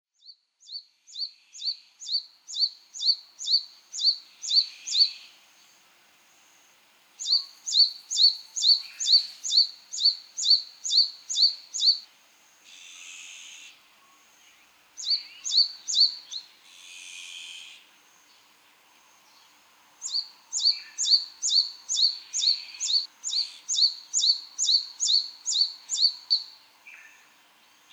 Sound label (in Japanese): シジュウカラ, 東京都三鷹市; 野鳥の声